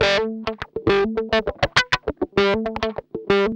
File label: GUI 100 BPM, Roland